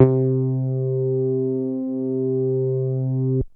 Bass Synths hits and octaves

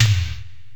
Korg x5, Drums

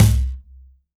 WORLD DRUMS MIXED DRUMS

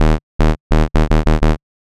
Low Tri Bass; Sonic Foundry (Sony Creative Software); Underground UK House & Electro

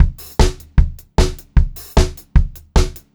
BEAT, Pop-Rock Drumloops; USB Soundscan vol.37; AKAI; 90 s Sample CDs; 152 SPEED

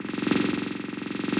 LOOP, 170 BPM, Drum & Bass

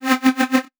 Synth, DnB One Shots

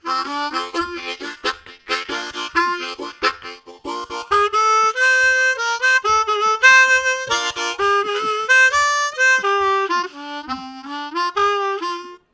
Country Chug, Harmonica; Zero G

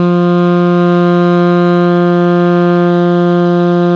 ALTO PP F 2, Sax; 4-WAY

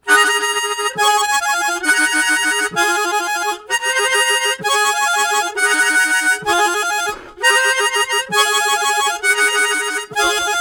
Oct Roll, harmonica riffs; Zero G - Harmonica

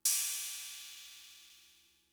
Cymbal, Fricke MFB-522, Drum Machines

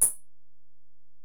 HiHat, Alesis DM-PRO

